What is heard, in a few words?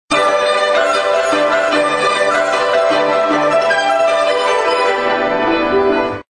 WIN MAX; games; Emperors China